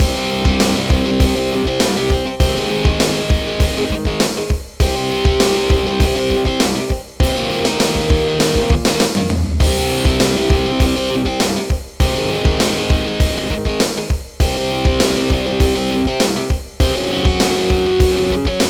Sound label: Guitar Mayhem; action